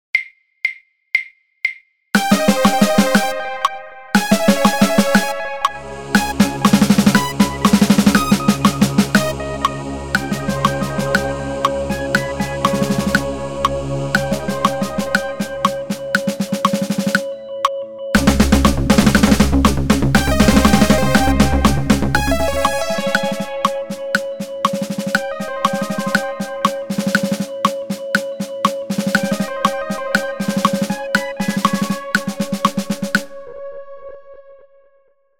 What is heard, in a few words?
snare drum synth click 120 bpm